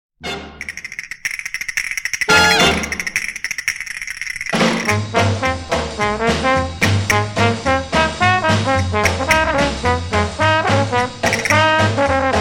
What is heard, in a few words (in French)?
orchestre